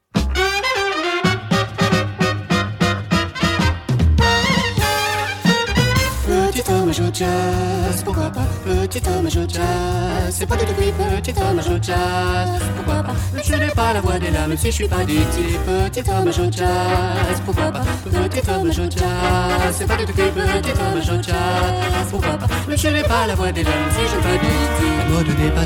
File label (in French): hommage jazz